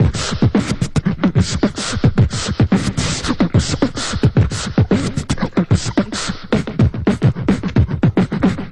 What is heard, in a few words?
chugalong, Beatbox, Drum loops